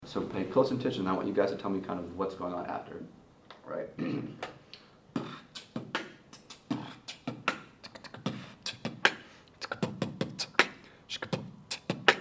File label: Beatbox